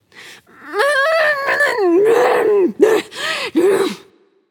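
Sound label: grumble, female; smite; voicepack; hera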